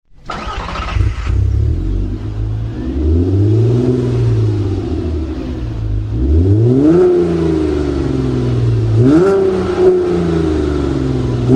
New Beetle RSi 3,2 V6; volkswagen

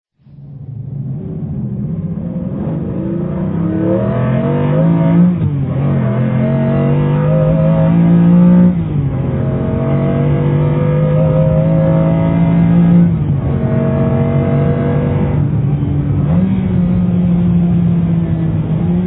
Murcielago acceleration, engine sounds; lamborghini

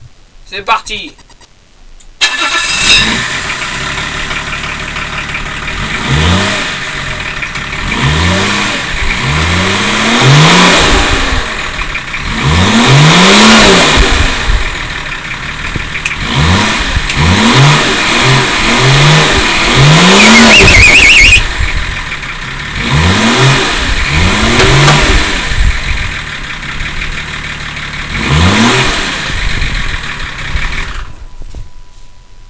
bmw 525tds, enginesounds

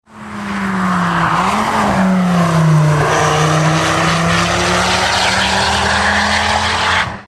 Viper RT10, dodge

